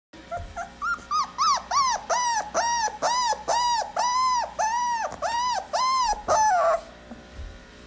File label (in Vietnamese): cho con keu uuu; tiktok